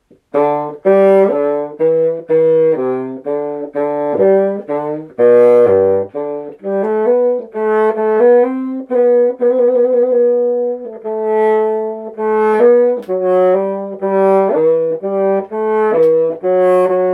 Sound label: Bassoon; Morning Prayer